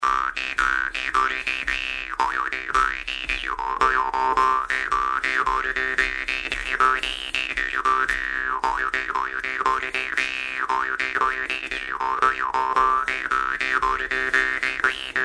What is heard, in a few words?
jews harp solo; off she goes